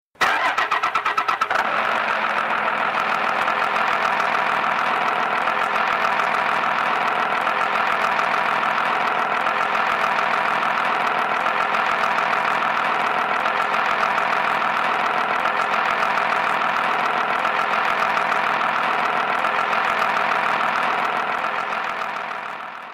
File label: Cummins engine start